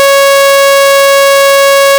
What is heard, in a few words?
554Hz Continuous, Sounder; Radio Voice Sounder with Beacon; SmartVOX